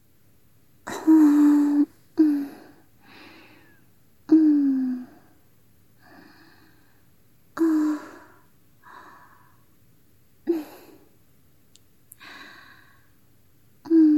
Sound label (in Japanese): 吐息ループショート(バイノーラル編集）; みみあね。 ～世界で1番弟クンを甘やかすお姉ちゃん～【囁き・添い寝・立体音響】; 効果音なし